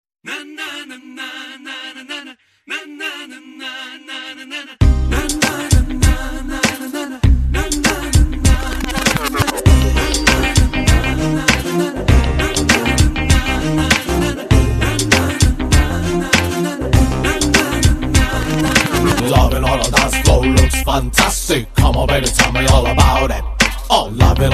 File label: hindi song background music ringtone